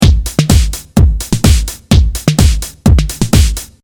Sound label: Beat 127